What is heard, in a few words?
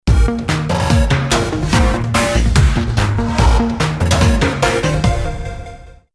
Electric Circuits